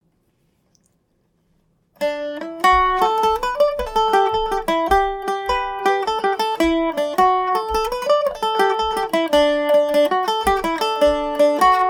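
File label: D mixolydian md304, Irish mandolin; road to durham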